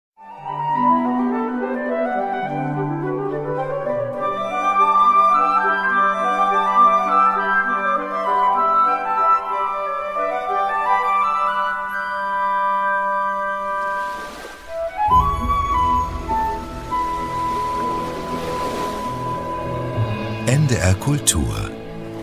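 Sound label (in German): Transition Flöte Klavier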